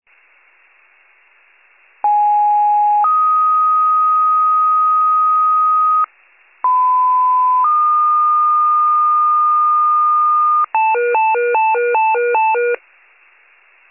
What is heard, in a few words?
FIRE pager alert